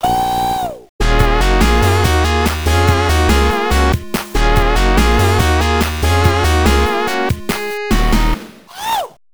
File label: Dance Attack (with voice); Michael Jackson's Moonwalker Genesis soundtrack